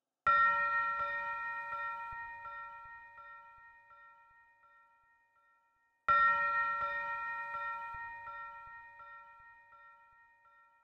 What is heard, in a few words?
165 BPM A# Min Bell FX, Loop Stems; Melodies; Cymatics; Domination; 2022 Melody Mystery Expansion